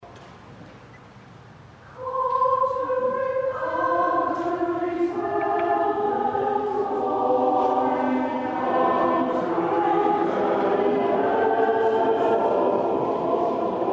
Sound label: anthem sung without accompaniment by church; remembrance; my fantabulous church choir